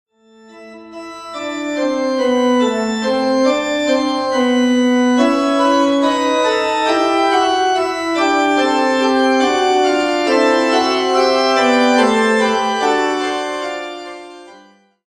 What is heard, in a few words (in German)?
Orgel